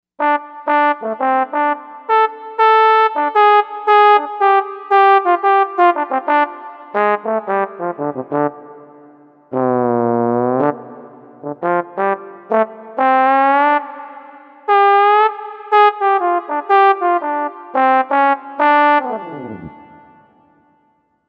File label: Trombone, Korg M1; Factory Patches